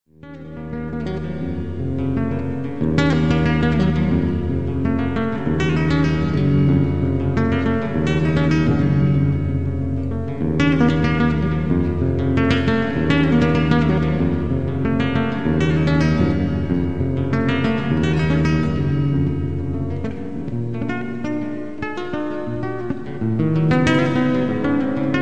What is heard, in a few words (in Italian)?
Fantasia flamenca